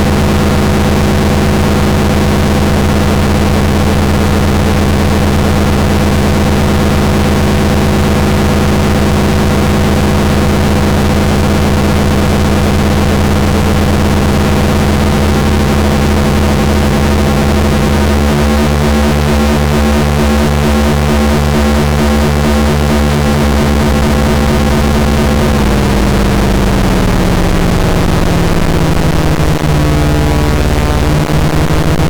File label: drone